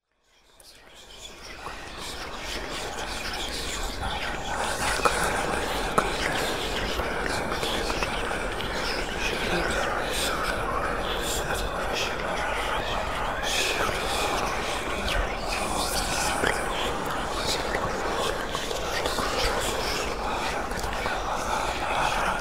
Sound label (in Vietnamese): am thanh nhung linh hon ma quy thi tham